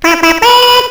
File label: find sword; Castle of Xhentars